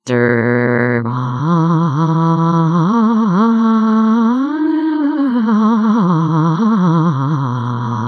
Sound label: mellotron alap